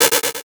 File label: Multimedia Internet CD-Rom Flash Click Multiple